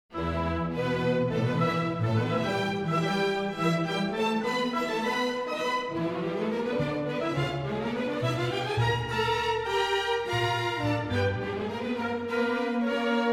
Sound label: chamber orchestra